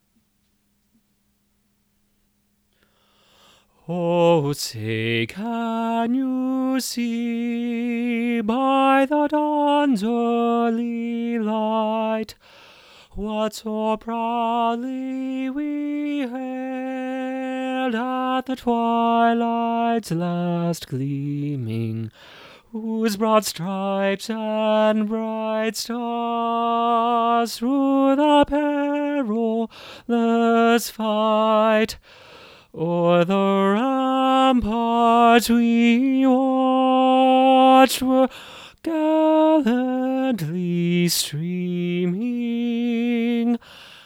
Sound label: tenor high, choir